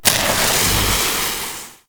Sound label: Proto Infested Maggot Spark Start; Warframe; Abilities